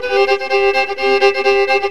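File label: C FIDDLE GROOVE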